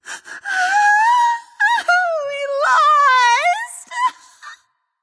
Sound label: boohoo light; smite; voicepack; hel